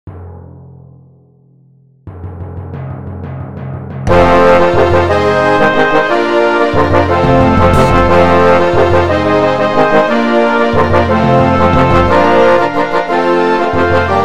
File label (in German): Fanfare für Sinfonisches Blasorchester